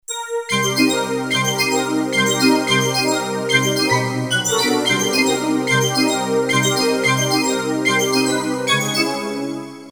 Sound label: synth, Roland JV2080